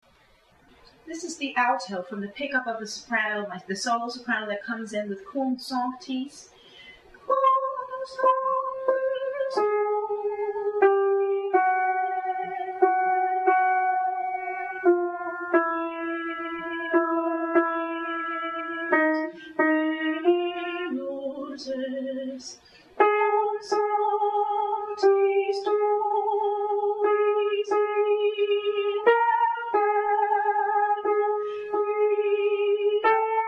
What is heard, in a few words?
Agnus Alto